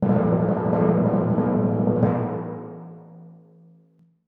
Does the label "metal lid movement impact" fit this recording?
No